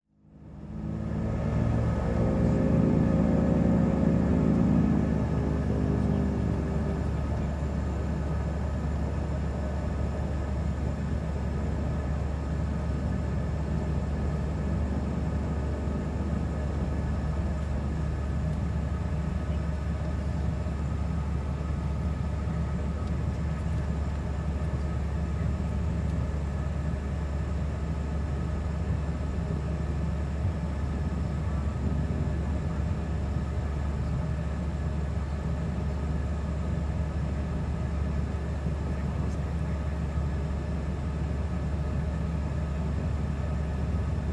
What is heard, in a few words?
Aircraft Ground Rolling Ambience